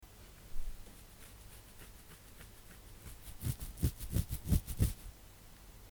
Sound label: scratching ones head